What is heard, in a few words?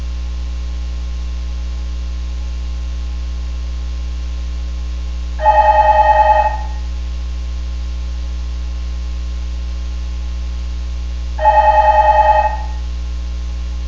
tintinnabulation